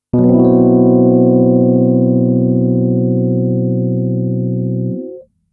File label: susb9